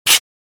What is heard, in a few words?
Air compressor machine hissing air release